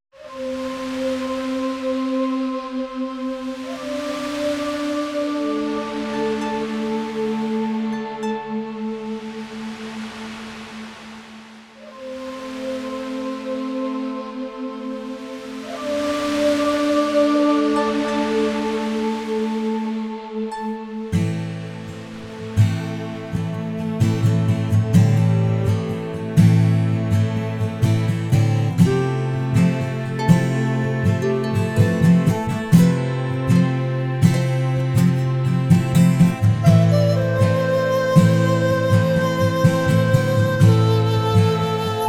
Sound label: dark forest